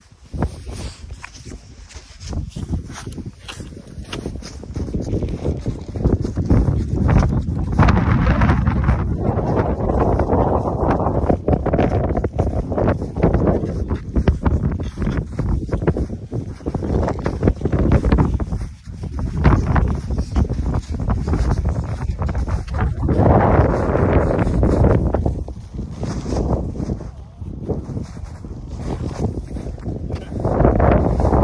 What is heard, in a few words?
Orne Harbour